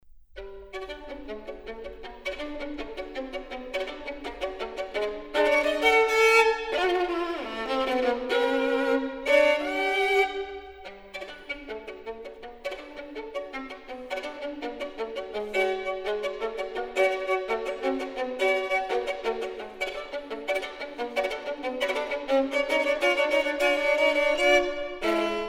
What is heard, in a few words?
Partita For Violin Solo, Scherzo